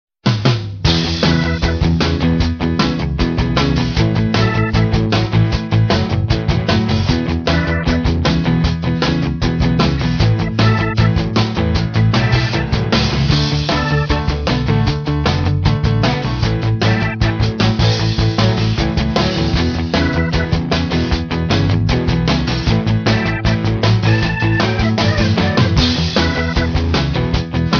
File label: california style ringtone